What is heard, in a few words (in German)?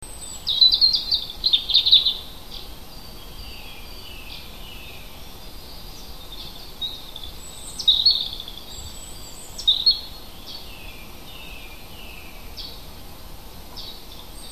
vogel heimisch; biologie; wald; april